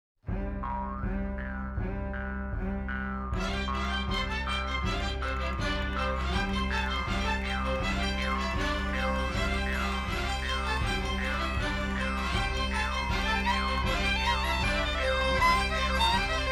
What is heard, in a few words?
Jig